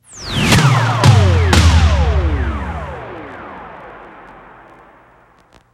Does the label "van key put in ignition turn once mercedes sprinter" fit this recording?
No